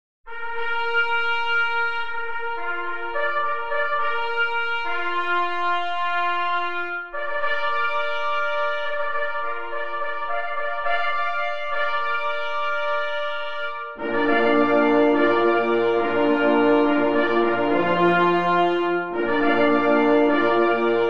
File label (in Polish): Hejnal na 2 plesy i 2 parforsy; Hejnal KL Knieja w Tczewie